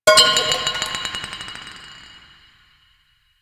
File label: Subway Garbage Amb Misc; Ambience; Warframe; Nineteen Ninety Nine